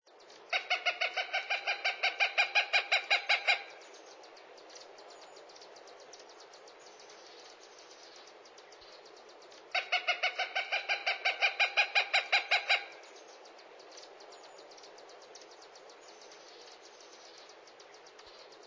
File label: Coopers Hawk